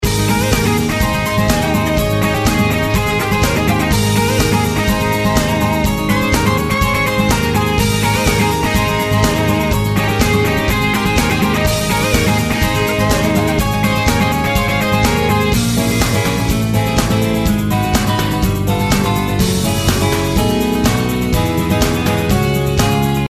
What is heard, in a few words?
acoustic; maoudamashii